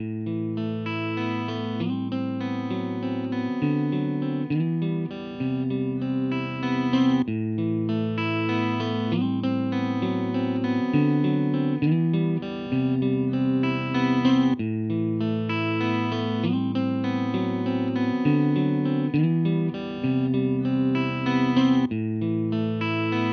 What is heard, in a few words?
Mooer Nux Fender Deluxe Reverb Mooer